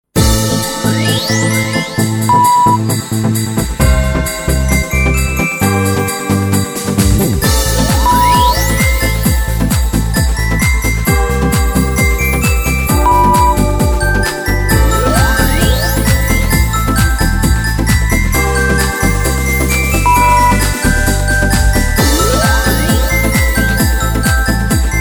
XMas Techno Party 132

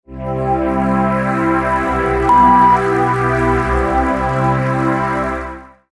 Yoga Bubbles Reminder

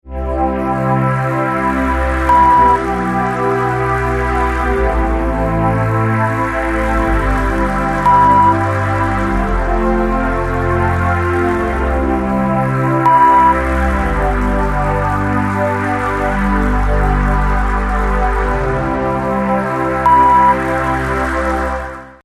Yoga Bubbles

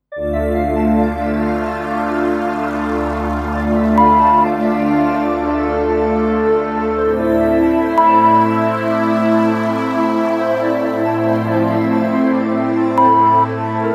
Meditation Rain Dreams F 70bpm; Meditative, entspannende Hintergrundmusik / Meditationsmusik

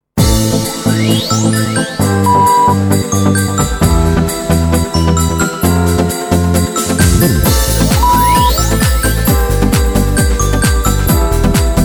XMas Techno Party, 132

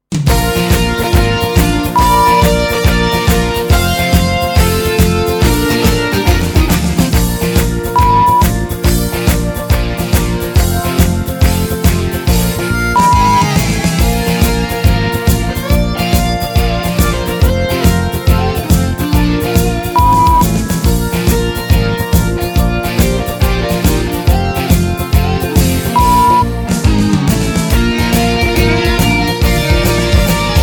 Rock A 140bpm; Hoch soll er leben

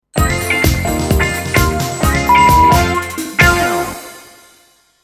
SoundLogo mit SoundBett 2Toene A funkig; Soundlogos für Ihr Corporate Design

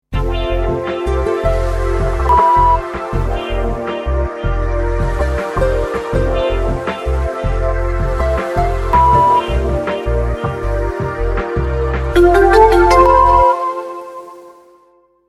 SoundLogo mit SoundBett 5Toene Dream Bb

